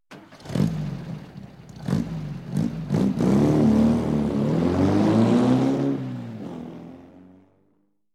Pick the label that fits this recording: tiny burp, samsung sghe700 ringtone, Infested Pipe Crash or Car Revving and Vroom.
Car Revving and Vroom